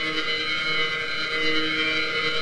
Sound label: ambience